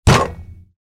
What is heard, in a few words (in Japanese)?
テーブルを叩く; 場面切り替えや心理描写などの商用可能な無料の演出効果音です